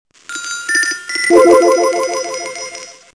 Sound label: Phone, ringtones